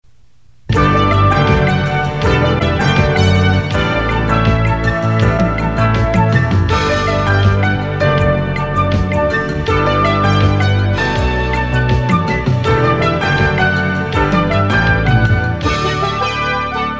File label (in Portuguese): BBC vinheta de espera via satelite